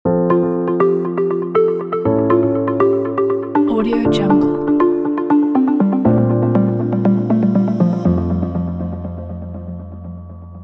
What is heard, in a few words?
inspired soft ambient logo; singlefx